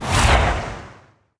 cast arcane; smite; god merlin

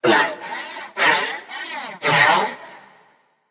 nv breath; smite; xbalanque

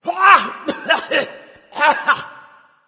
clearthroat; cuchulainn

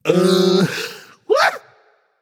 confused; smite; agni

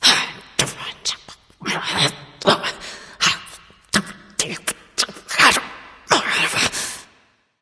grumble; smite; arachne

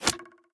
roman ranged bow impact; smite; npc; roman archer

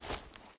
angelic step; smite; thanatos

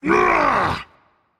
grunt attack rage; chaac

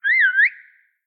defeat whistle; smite; lancelot

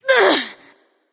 ouch; Smite; artio

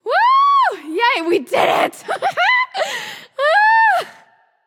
yay; smite; scylla

